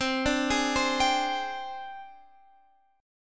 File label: Ab Major 7th Flat 5th C